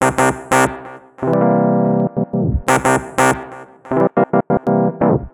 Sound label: Reverse Stop; реверс